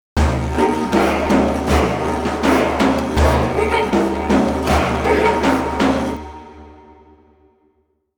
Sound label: General Combat Transition Layer; The Witcher 3 Wild Hunt; Ard Skellig